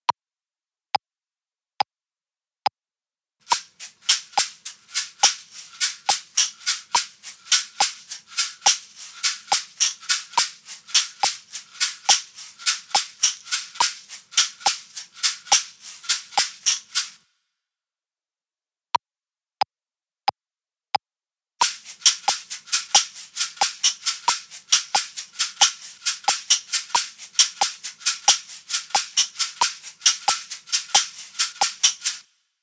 Con due Caxixi Differenti; shakers

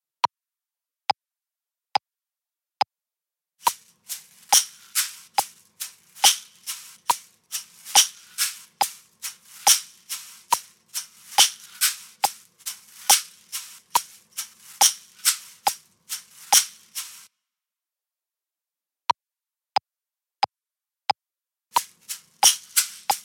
Con due Caxixi Differenti; shakers

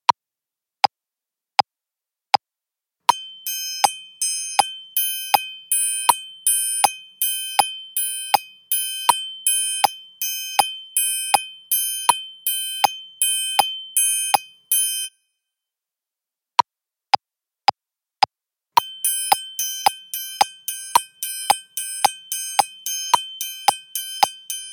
triangolo

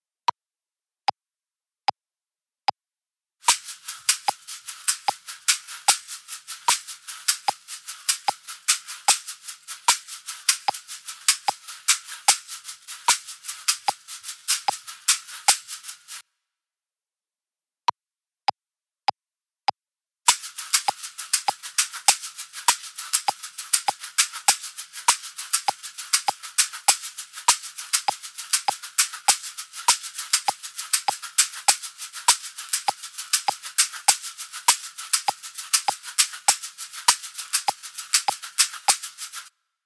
Con due Caxixi Uguali; shakers

